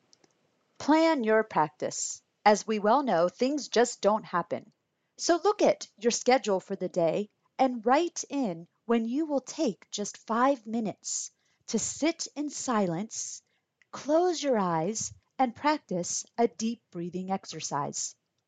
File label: Breathing